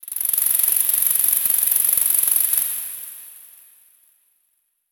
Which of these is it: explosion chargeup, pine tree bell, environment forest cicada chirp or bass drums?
environment forest cicada chirp